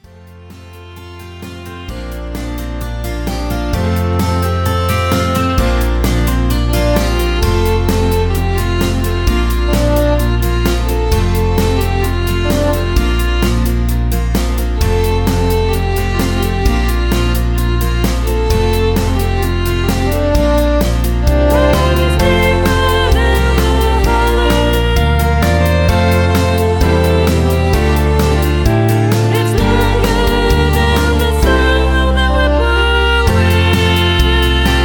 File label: Instrumental With BGVs